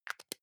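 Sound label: deodorant can lid close soft, badezimmer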